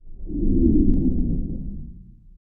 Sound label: Swipes; Electa Quinney